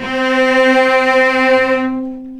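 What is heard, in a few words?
cellos attack C4 L, String; arco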